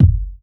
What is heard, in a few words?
Kick Box, household rhythm samples Hits